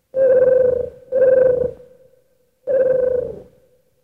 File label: coos